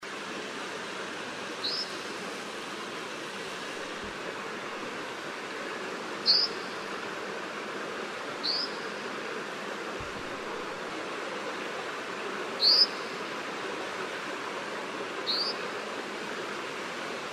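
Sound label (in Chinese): 河烏, Yushan National Park; 褐河烏; 河烏科/留鳥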